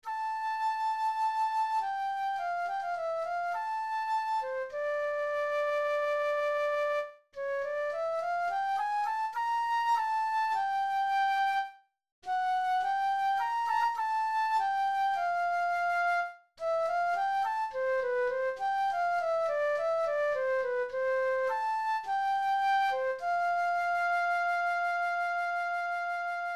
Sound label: flute